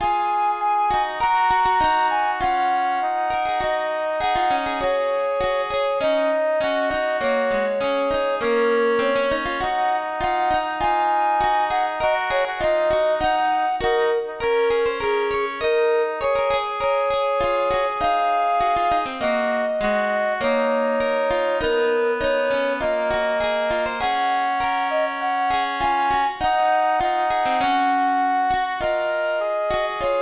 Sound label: tune